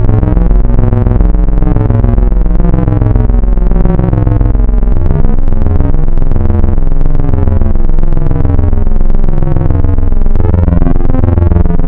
Spectral, wavetables; Architecture 32

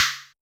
Yamaha DJX 2B, SD high metal

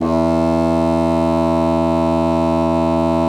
BASSOON E2; Xsample VOL-6; C BASSOON

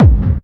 KICK hardcore, Techno Trance DRUMS

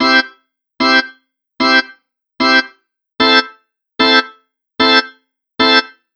HAMMON LP, ORGANS, Ragga & Dub Reggae

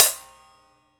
Prepared Drums

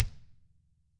DW, Double Platinum Drums; ILIO; Double Platinum Drums 1

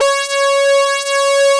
SYN Ana Lead; Best Service Gigapack 1

